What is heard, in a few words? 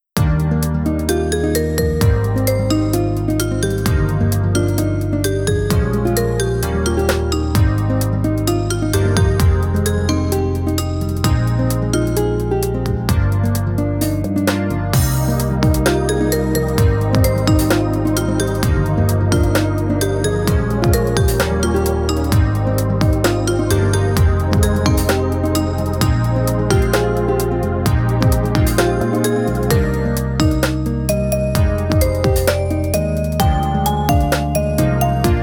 Character Theme Sad Arrange; Madoka Kijoh; tokimeki memorial girl s side